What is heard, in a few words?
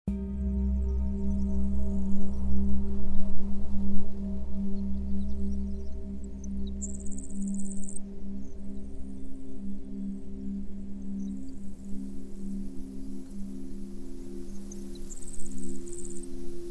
Wind Rain and Thunder; Theta Music